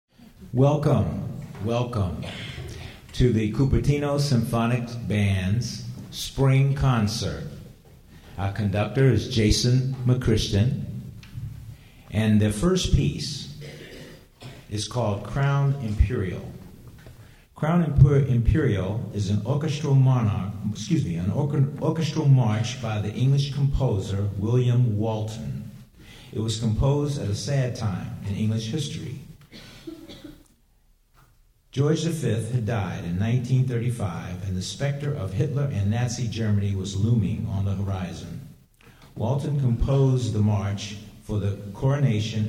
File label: Bay Bells